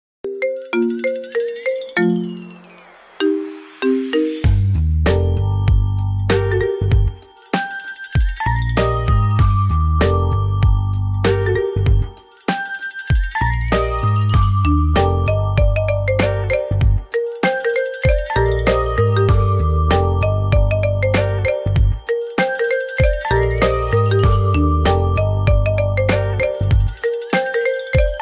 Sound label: nokia iphone ringtone